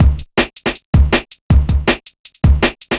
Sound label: Beat, Drum N Bass